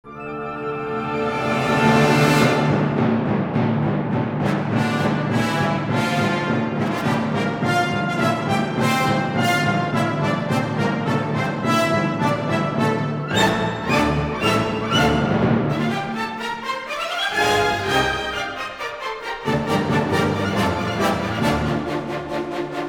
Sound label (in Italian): allegro non troppo